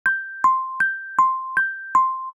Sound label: alarm